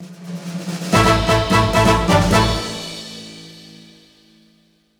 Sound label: Stingers Win; mechstermination force windows gamerip 2020